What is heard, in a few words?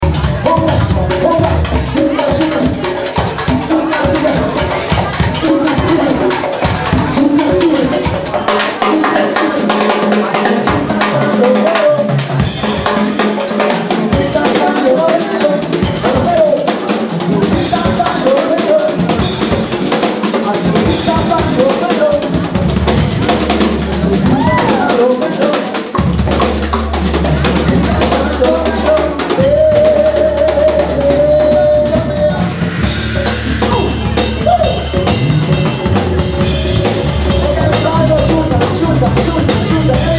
percussion